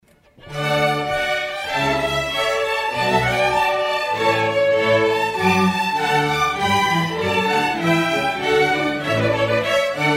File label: Concerto D Major